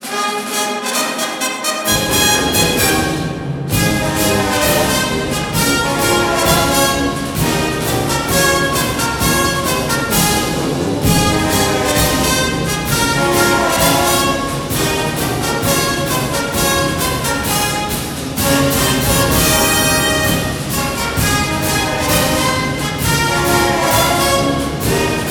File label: Broadcast Fight Song